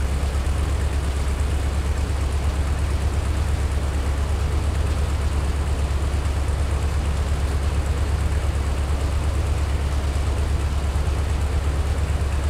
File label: m24 engine rpm 00, engines tanks; War Thunder